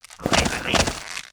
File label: WAR ATTACK